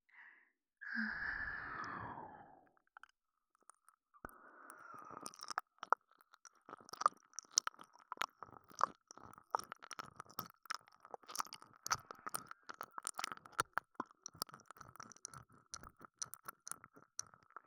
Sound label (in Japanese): 耳舐めリピートボイス 左耳Ver; 桃花ちゃん